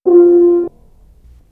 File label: tuba High